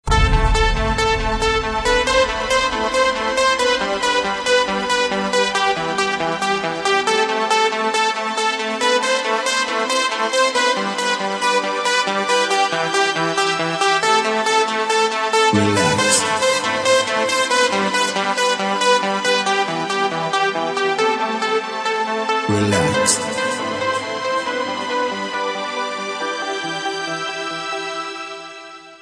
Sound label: Relax clubmix